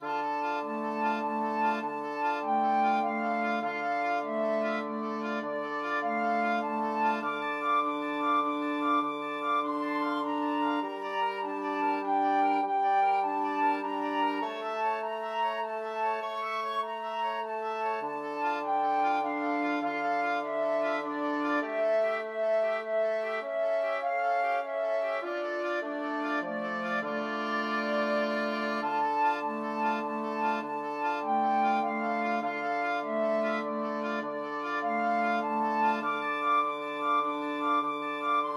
spanish romance, flexible mixed ensemble 4 players